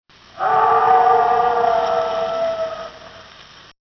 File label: howl; Frogatto